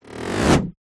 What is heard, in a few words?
swell rattle grate accelerate tonal descend whizz design cartoon whoosh zoom; skyclad sound